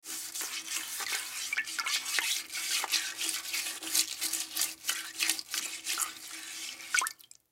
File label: Toilet Brush, Toilet Brush Cleaner Clean Toilet Slosh; Bathroom; Household; Blastwave